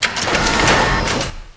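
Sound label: build deploy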